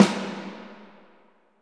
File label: snare, Roland; LA Composer